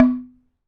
Wooden Bar Hard Strike

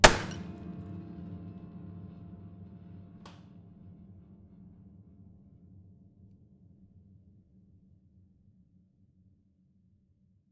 Key Smash, Piano Destruction

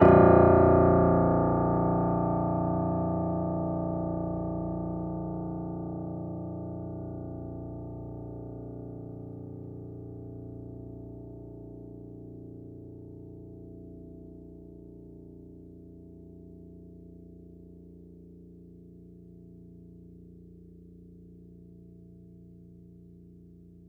Note Ped, Piano; Piano Miscellaneous

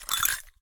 Cowbell Chain, Percussion

